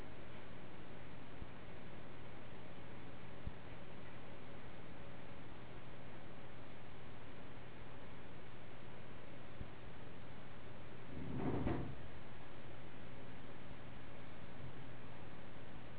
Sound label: Moving furniture